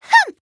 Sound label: "hmph!"; kotoko